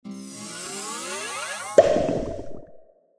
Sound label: bubble burst; poker game